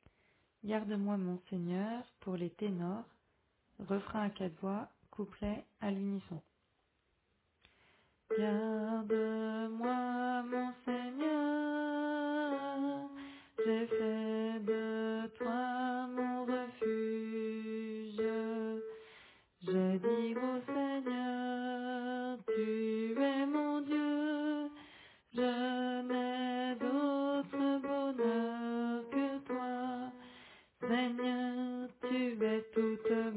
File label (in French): Tenor